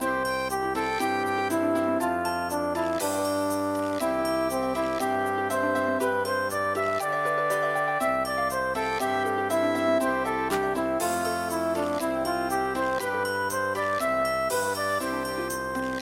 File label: nursery rhyme